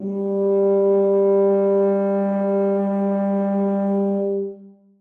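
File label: tuba sustain g3; Sonatina Symphonic Orchestra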